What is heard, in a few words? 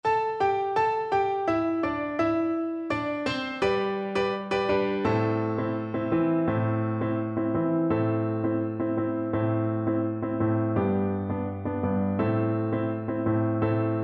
taino tee, Flute